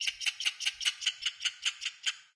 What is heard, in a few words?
random jungle; svencoop; underrain